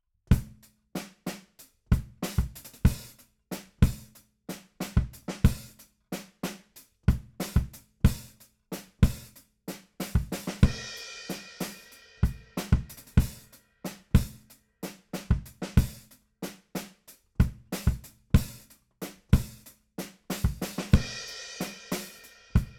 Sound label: BD Out